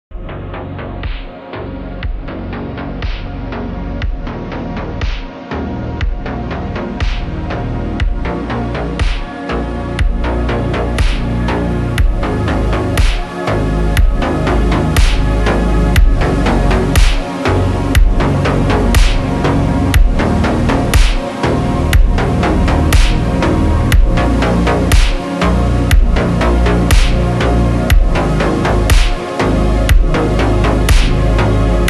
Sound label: slowed